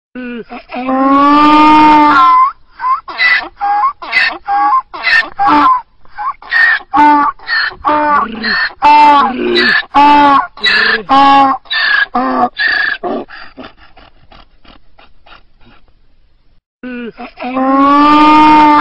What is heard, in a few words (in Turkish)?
Esek sesi